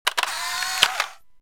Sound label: Camcorder Stop; SKY IM-S610K; System Sounds; Camera; Video